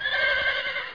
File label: horse snd